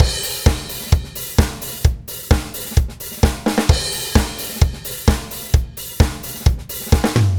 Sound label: 4 beat